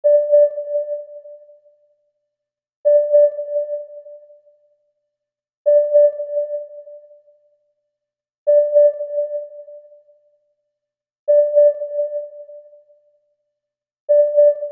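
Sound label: high sonar sound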